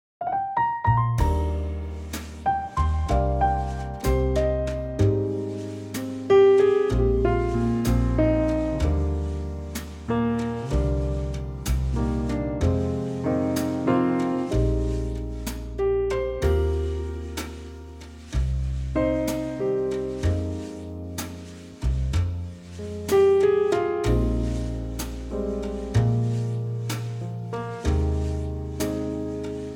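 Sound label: Eb, Backing Tracks for Male Vocals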